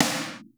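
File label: processed snare, drums; Crypton - DrumBase5000; PROC.DRUMS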